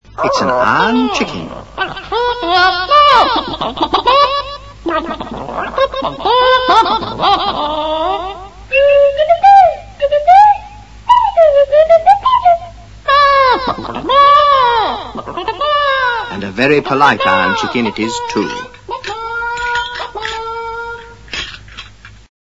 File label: chicken; classictv; clangers